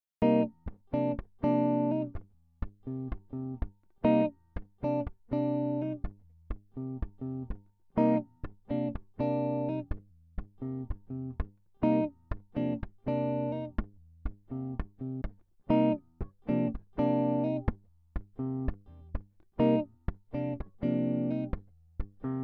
Cm124 Funky Groove

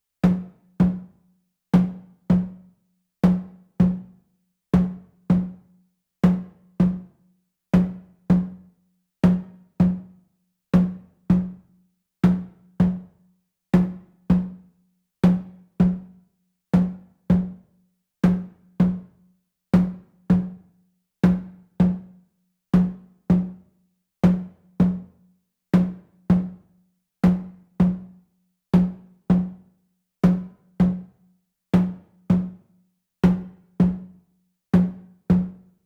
congo bongo

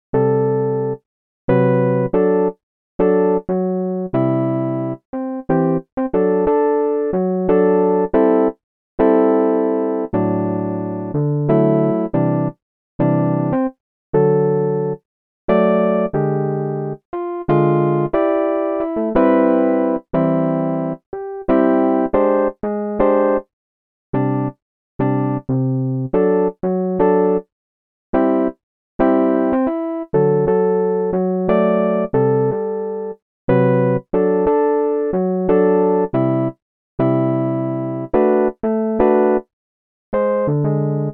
Blues Jazz A minor 120BPM

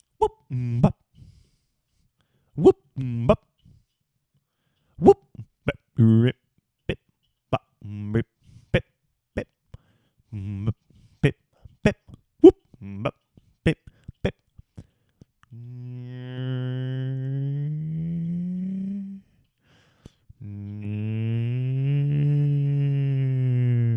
Vocal beatbox and doowahs

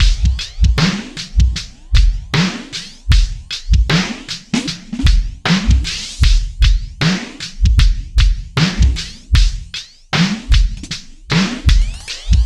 4bar 77 Warble

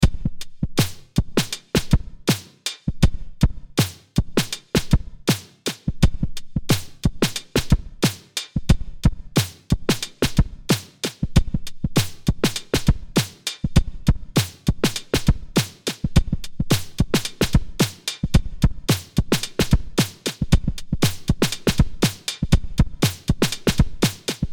Drum; Drum Factory

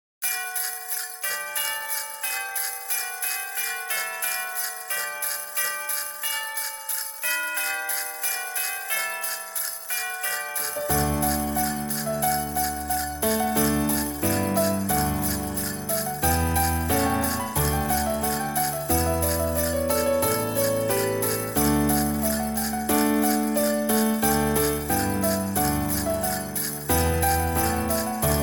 jingle bells mix

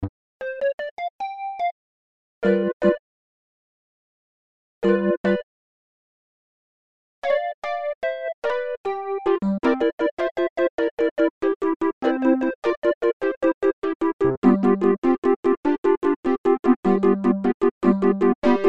e piano loops

